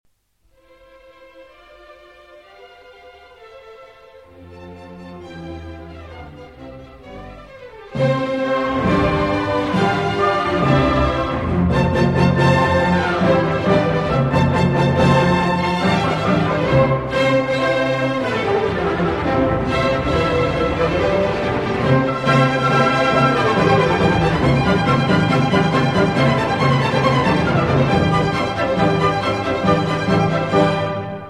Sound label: Symphony No. 41 in C Major; Mozart Symphony No. 41 in C Major, K. 551 Jupiter